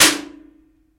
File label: Metal Clank Inside